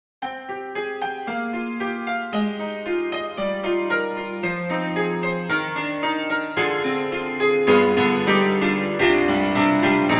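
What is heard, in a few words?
Pop Chorus, piano; Steinberg HALion 6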